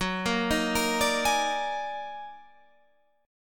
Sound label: D sharp Minor Major 11th F sharp